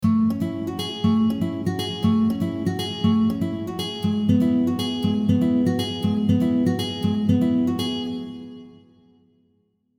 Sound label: guitar